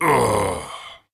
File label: death Man; endless world idle rpg gamerip 2019